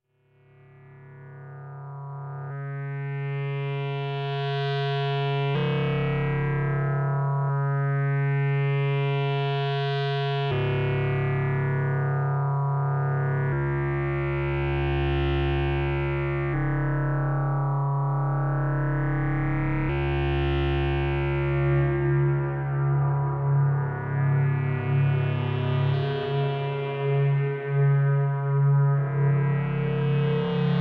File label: ambience, synth; uhbik; analogsynth